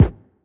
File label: Wall